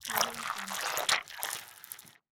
fleshy transformation

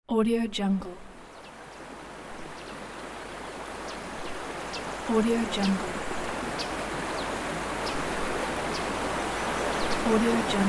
stream and birds

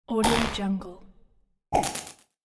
negative scifi touch

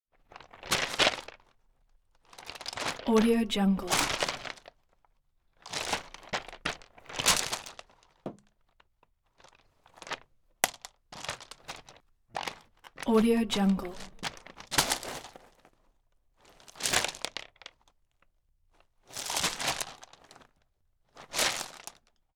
pebble skids

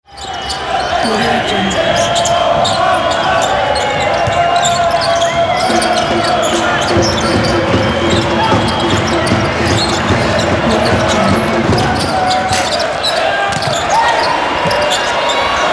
crowd chanting and cheering basketball arena

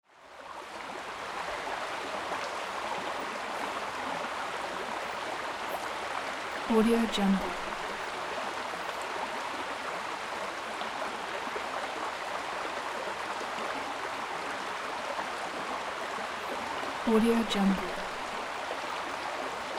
ambience river

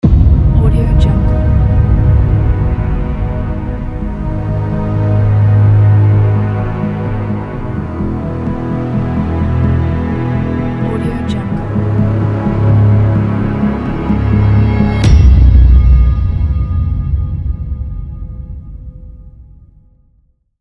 epic logo ident

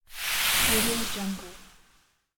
smooth minimal transition

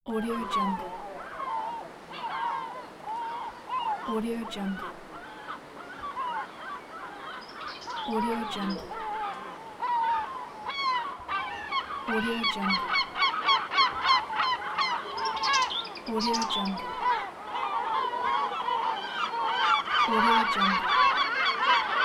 seagulls ambience

